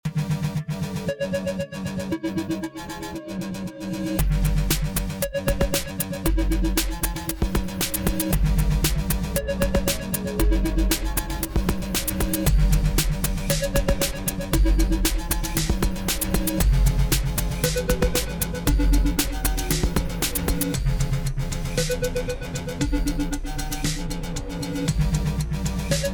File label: cave